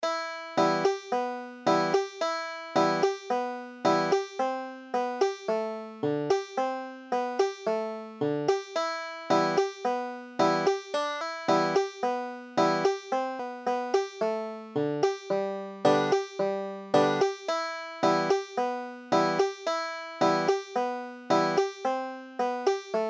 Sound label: banjo